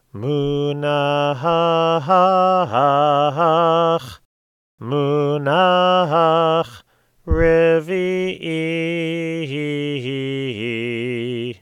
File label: Torah Trope Revii